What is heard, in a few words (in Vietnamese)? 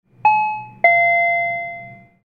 âm thanh tiếng chuông cửa